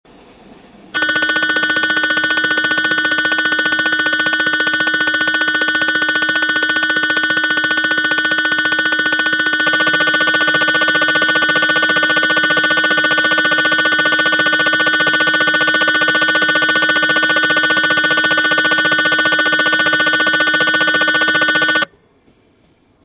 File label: koto